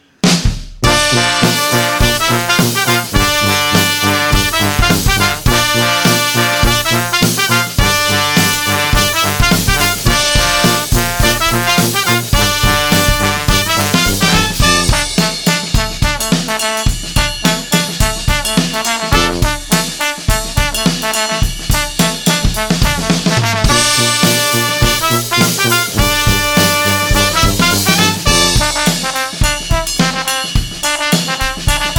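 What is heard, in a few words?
brassy, Roaming Brass Band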